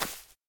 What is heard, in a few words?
bolt grass, material; STALKER; IWP